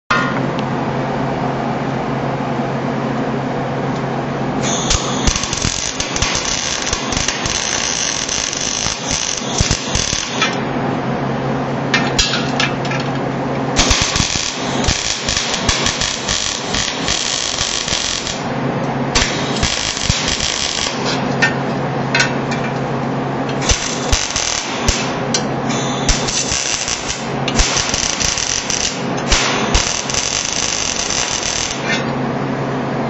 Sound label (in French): Soudeuse à l'arc semi automatique